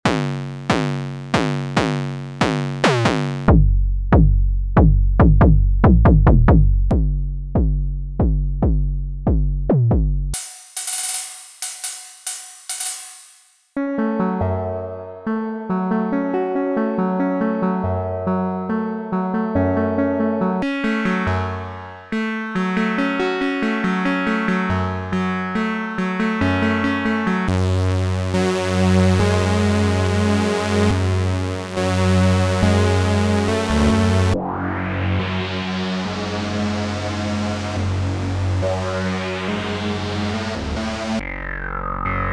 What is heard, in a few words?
analog journey; oberhausen